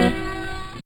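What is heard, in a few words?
Hip Noise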